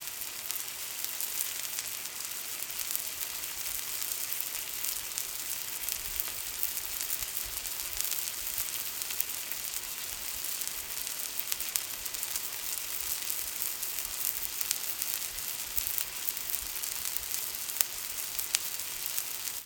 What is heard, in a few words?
Fire and food Hamburger On Stove